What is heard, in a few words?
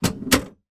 van seat distance from pedals adjust mercedes sprinter; transportation; sound effects; sound effects one